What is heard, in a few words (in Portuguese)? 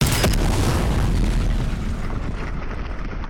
porrada com explosao